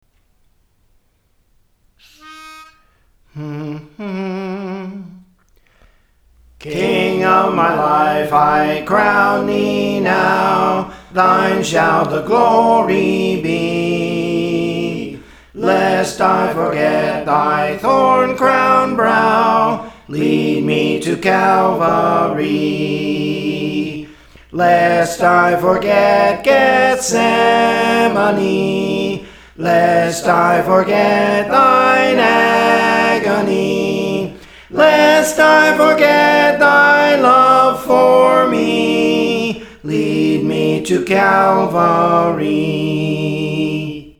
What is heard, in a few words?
Lead Me to Calvary (Soprano); Praise For The Lord Training